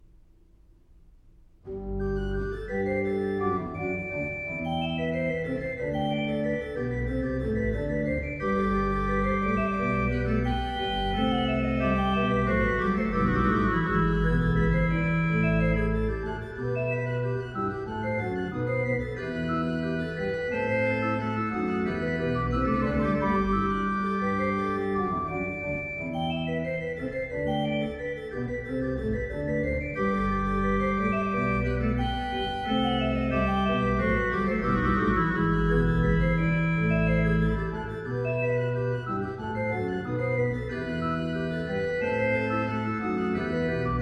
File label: Herr Christ, der einig Gotts Sohn, BACH ORGAN; Chorale preludes; BWVAnh.55; Orgelbüchlein